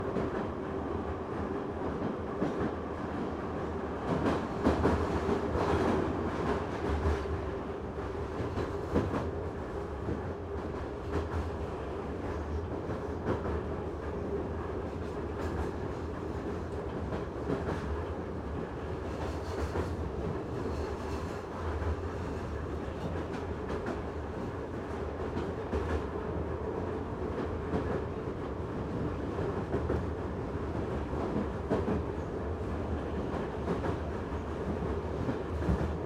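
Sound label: train interior ride outdoor to tunnel, SUBWAY; Borys Peter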